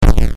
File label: wall bonk; Boxen; Robot Wants Ice Cream